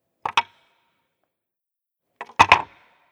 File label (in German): Kinder-Gedeck Tasse raus rein